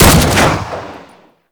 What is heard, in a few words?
Sniper Rifle Shot; Warframe; Weapons; Tenno